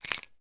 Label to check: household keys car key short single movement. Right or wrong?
Right